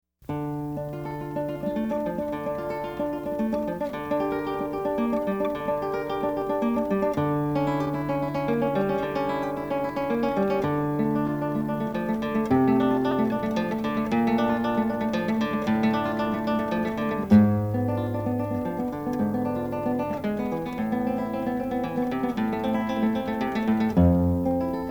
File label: Lute Prelude; Classical; Bach; All-Bach Program